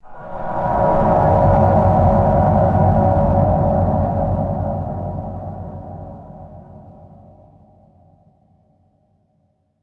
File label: thick airy synthetic sci fi swell